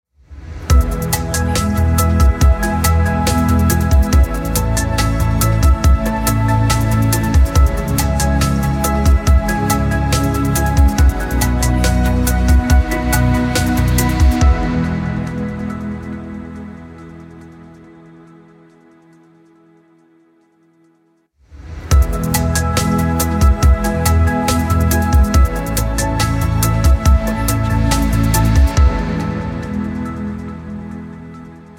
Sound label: futuristic technology logo